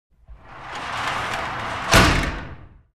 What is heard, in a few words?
The metal compartment door closes